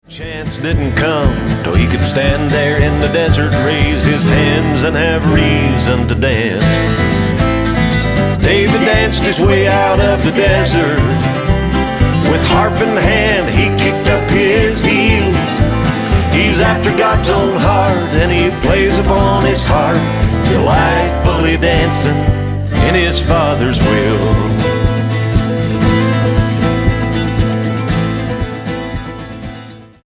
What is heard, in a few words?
Desert Dance